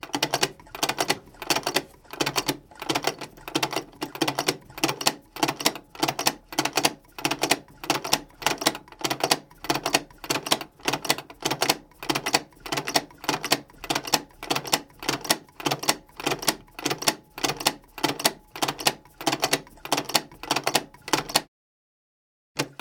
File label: large gear metal wood slow; Mindful Audio; Elements - Gears